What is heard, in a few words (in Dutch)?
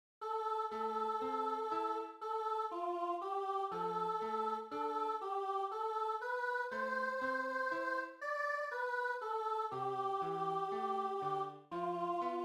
tenor